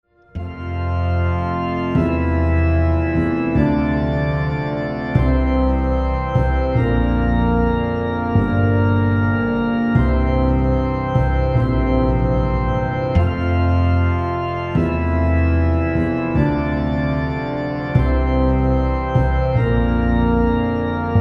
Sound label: backing track